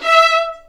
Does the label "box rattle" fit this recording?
No